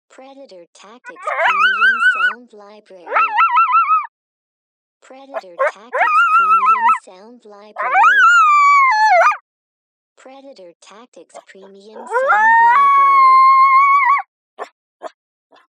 Cardinal Hot

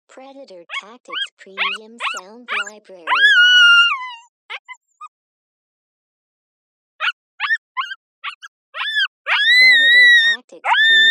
12 wks Pup Howls

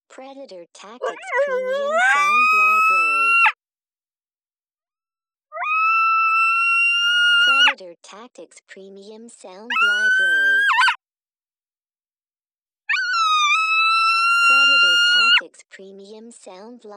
HOWLIN

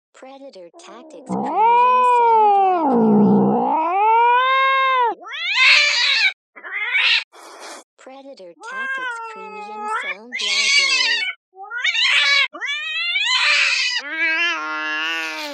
Barn Cat

